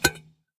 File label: metal pot soft hit